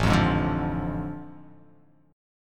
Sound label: A Major 7th Suspended 4th Sharp 5th